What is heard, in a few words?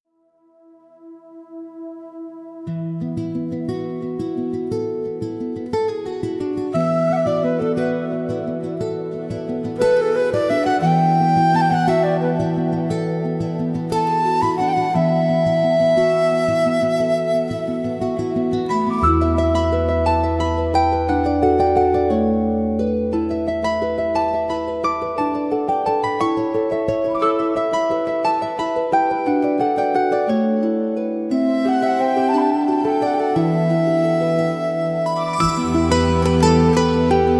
forest background, music; map backgrounds